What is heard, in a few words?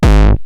Roland TR-909 BD; BD Selection